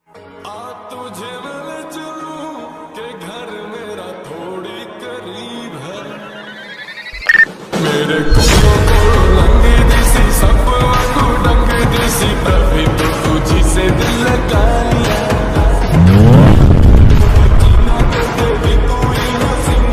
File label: slowed reverb ringtone